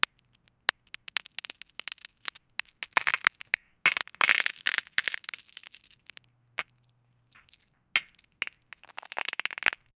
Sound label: kinetic sand cube droppin